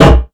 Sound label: drywall impact, Bullet Impact; Dystopia; physics